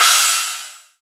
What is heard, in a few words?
Crash, Drums Cymbal; Best Service; House Definition VOL-1; Cymbal Stuff